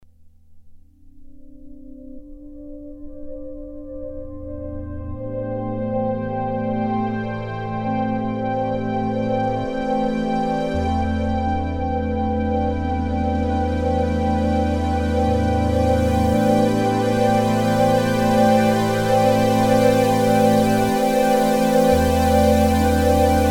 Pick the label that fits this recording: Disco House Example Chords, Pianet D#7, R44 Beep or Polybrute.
Polybrute